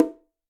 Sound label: Bongo A L Open; Latin Percussion; Drums; Drum Hits; Ableton; Factory Packs